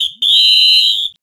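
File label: WHISTLE, PERCUS KIT; Big Beat Samples & More; AKAI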